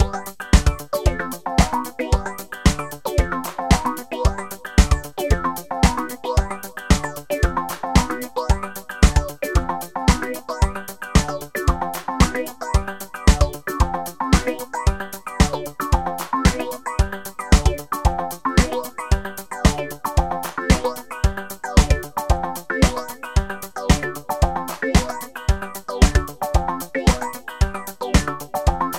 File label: Disco Clap Ringtone